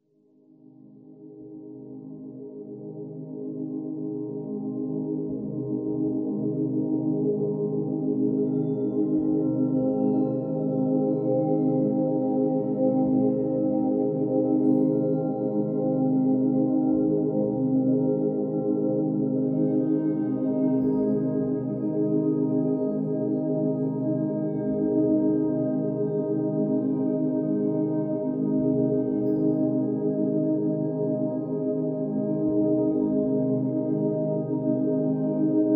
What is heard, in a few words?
binaural beta waves